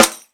Airy Sizzle Snare One Shot C Key, steel snare drum sample tuned to the C note